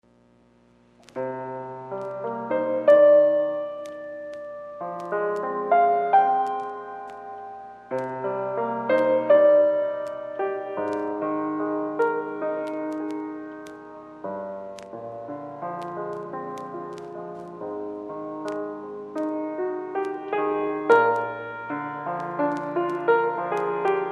Piano Piece

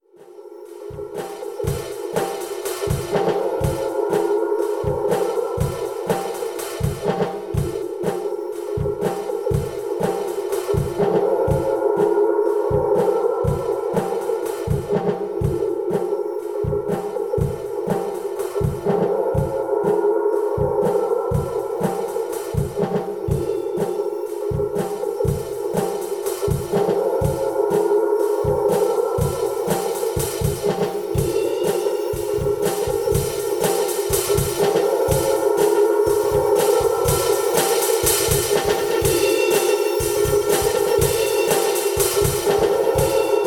vegetative drum beats; softmartin

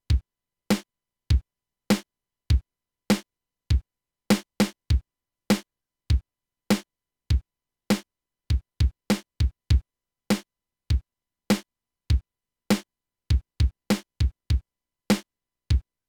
Slow drums

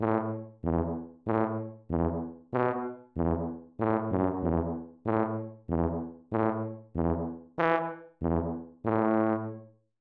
Happy Munchkin Music

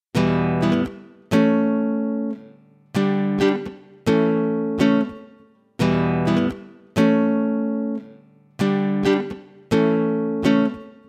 Jazz Blues in Em 85 bpm

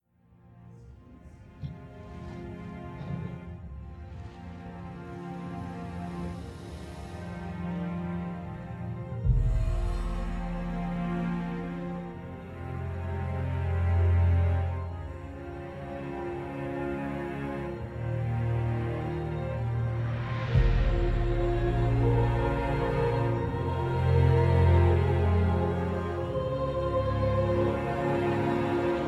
scales

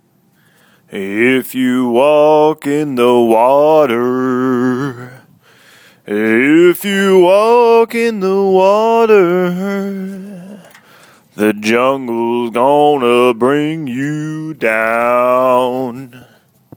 Jungle Water

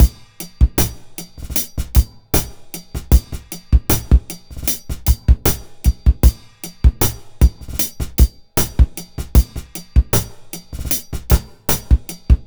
4bar 77 (m)

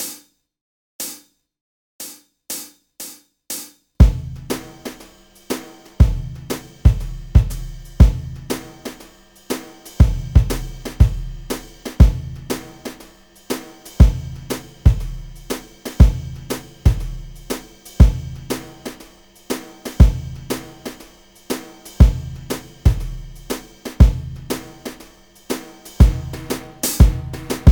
Blues Jazz A minor 120BPM

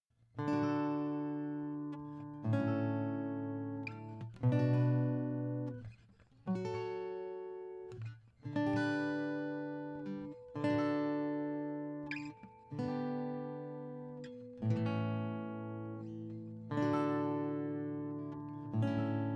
Chipped Baroque

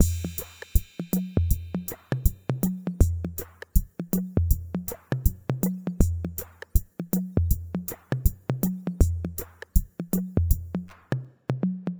beat 80 bpm; Mixter Plus Deep Roots beat pack

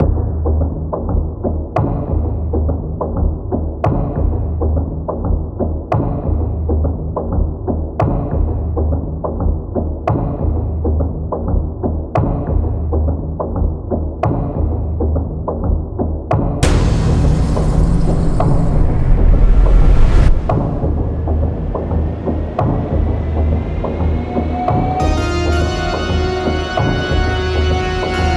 trailer (instrumental)

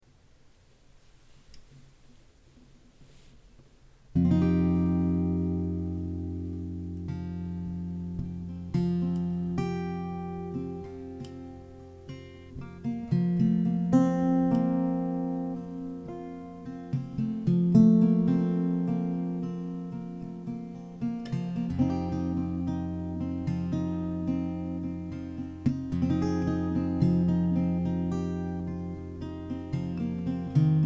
F110 Alt Tune dry

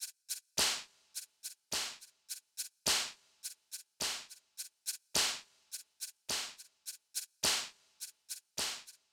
March of the Bells

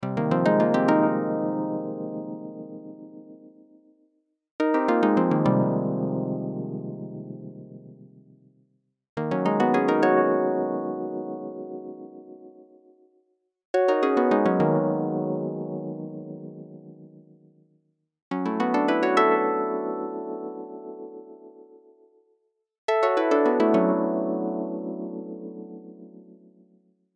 Synth Harps; Starry Night

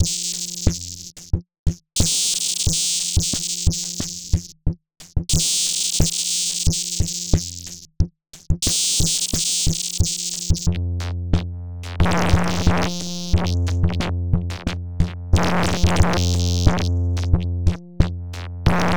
E90 Groove

